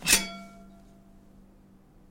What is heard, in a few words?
Metal Pan Clank